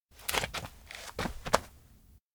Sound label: steps outdoor road back step; fumiya; anemoi